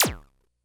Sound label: Bleep; Korg DS-10